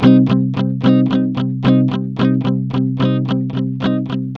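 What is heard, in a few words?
ROCK B minor 110, Vintage Blues Guitar; USB Soundscan vol.22; AKAI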